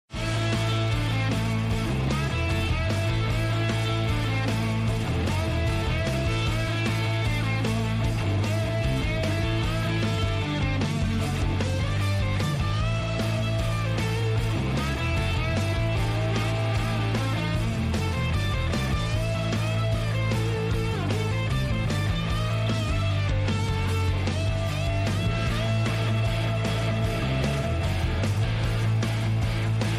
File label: Rock Dance